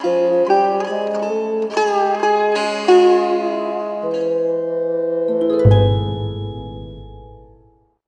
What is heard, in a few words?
Bari Sax